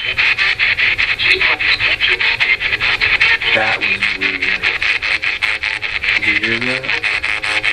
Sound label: Ghost box; Box Room Annex